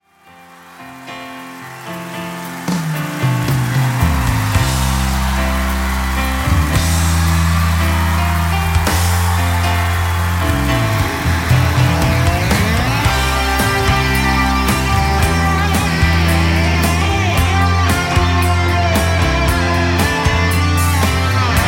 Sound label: Guitar Solo